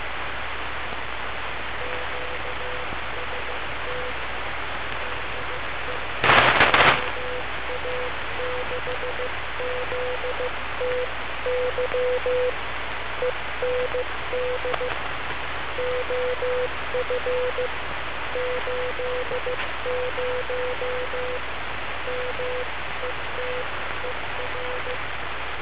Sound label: fall 80m